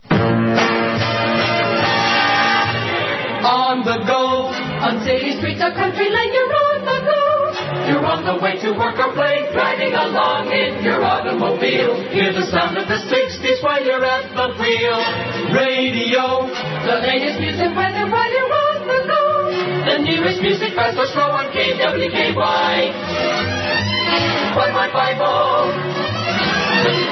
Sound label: jingle